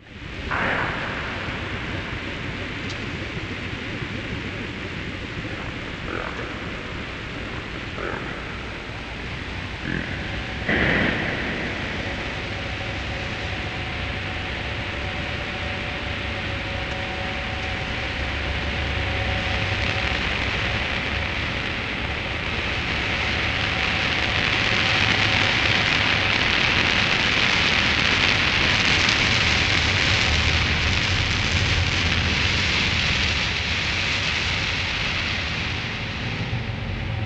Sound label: Rain; Zero-G - Ghost In The Machine